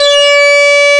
SYNCHRO; East West - Global Sounds; THE CAT

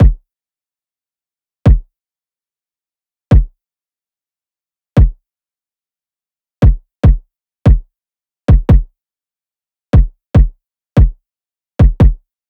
loop kick 145; parthenope

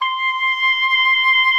C6, OBOE; Xsample VOL-6

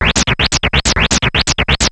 TECH KIT, RAVE

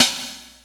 perc, Percussion; Trance Explosion Vol1

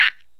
PERC KALIMBA

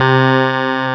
SYN, Wavestation

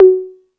Beat Box, E-MU Pro-Cussion; drum machine

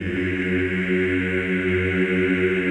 CHO F#1, Eeh; Eeh + Ehh

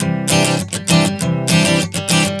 Strum 100 C, One Chord Strumming 100 bpm; Acoustic Guitar

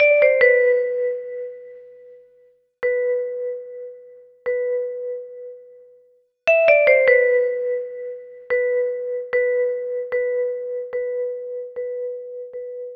Just Vibes, Cinematic Funk Urban Tone Poems; Sonic Foundry; Sony Creative Software

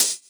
Hhat, Hihat; Equipped Music; Loungin House

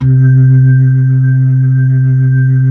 B-3, Blues

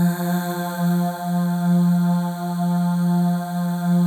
AH, FEM; Spectrasonics; FEM.2 AHS

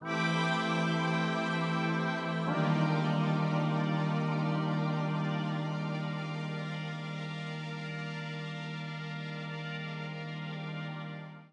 PAD, Hip Hop and R&B; Drumloops; Best Service; ProSamples vol.01; AKAI

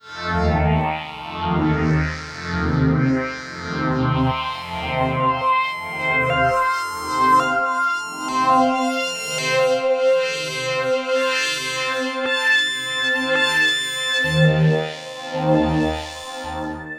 Swirling Richness CEbFA, Analogue; Zero-G Ambiosis

